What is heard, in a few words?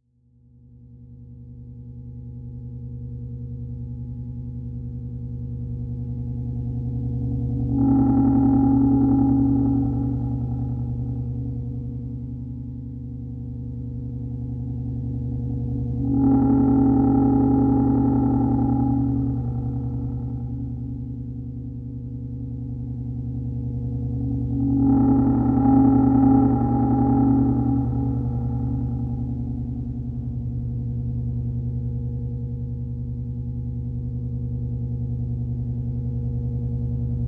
Moaning Drone; Little Nightmares complete soundtrack